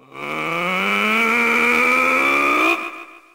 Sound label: charge; RedDot